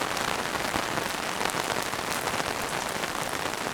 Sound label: rain umbrella, pouring